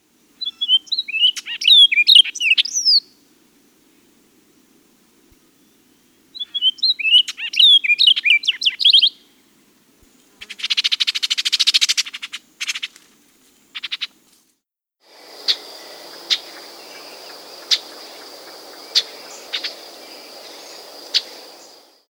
Orchard Oriole

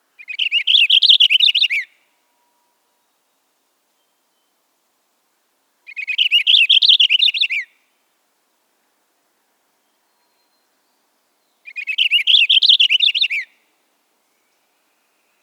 Purple Finch

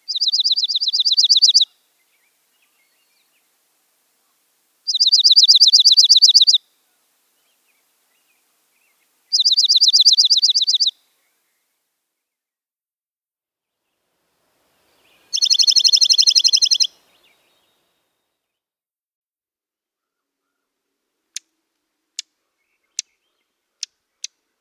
Dark-eyed Junco (Slate-colored)

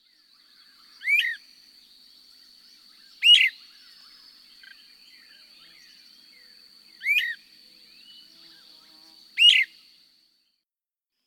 Great Crested Flycatcher

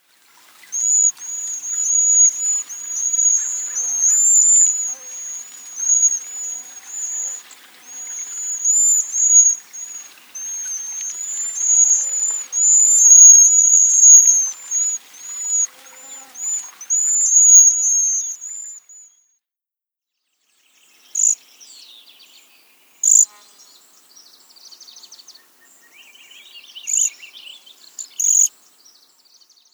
Cedar Waxwing

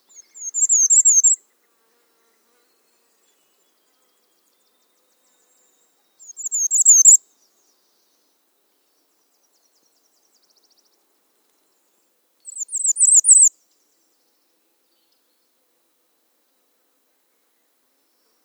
Bay-breasted Warbler

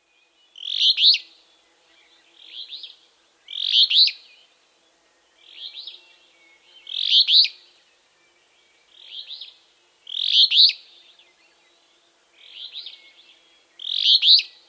Alder Flycatcher

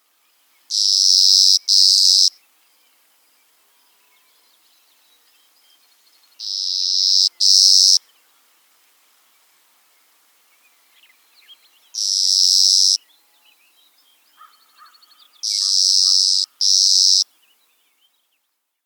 Clay-colored Sparrow

